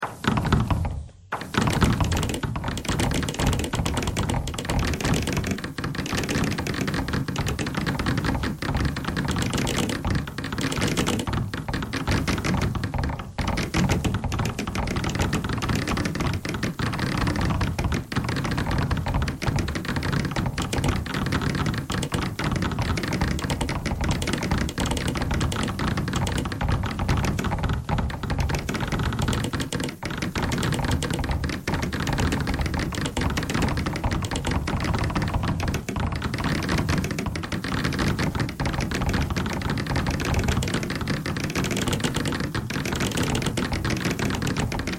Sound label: bagpipe thread noise